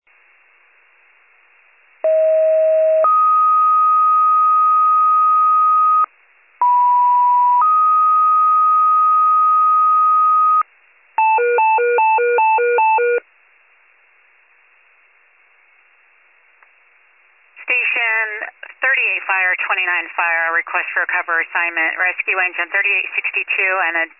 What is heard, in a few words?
FIRE pager alert